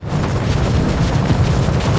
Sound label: droideka Roll